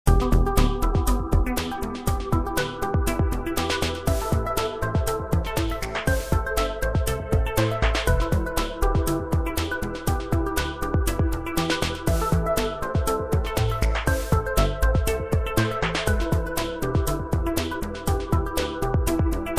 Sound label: arp